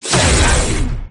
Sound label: fire, weapons